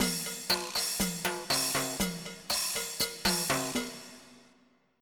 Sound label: BOSSA